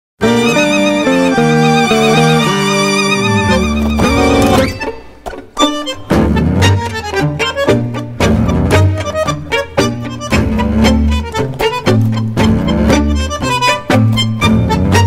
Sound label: tango Music